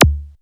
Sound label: ZAPP; ELECTRIC-SET